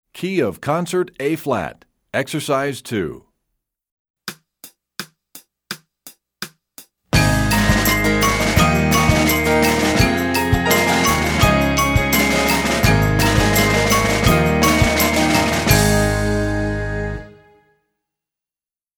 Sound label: Concert A flat, Percussion; EE2000 Book1